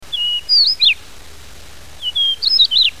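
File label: stonechat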